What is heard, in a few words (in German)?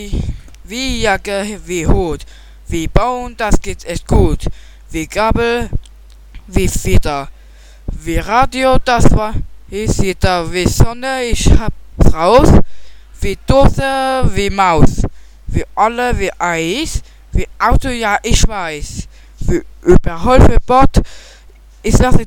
Tabellen Rap